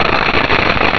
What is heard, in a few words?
tick